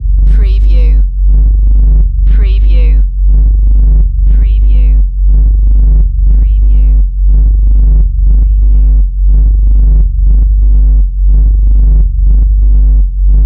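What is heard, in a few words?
Distorted Bass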